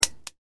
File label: click